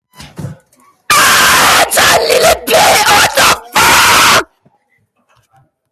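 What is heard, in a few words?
screaming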